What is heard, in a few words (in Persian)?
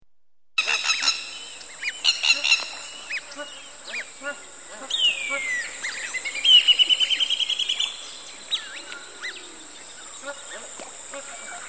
Tabiat